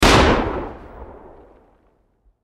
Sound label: Grenade Explosion Debris Blast; Grenades; Explosions; Warfare; Blastwave